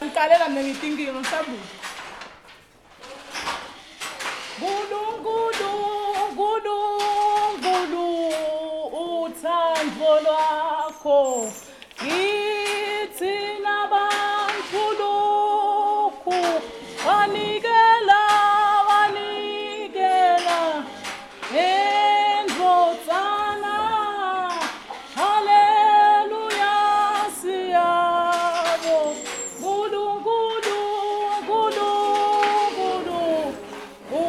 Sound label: Morning song at Coral Stephens Handweaving